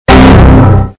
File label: windows error sound but bass boosted